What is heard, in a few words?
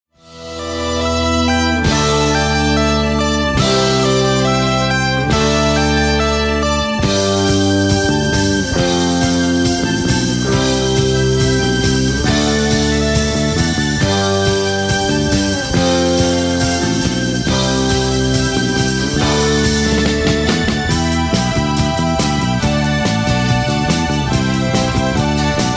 strum; Kamui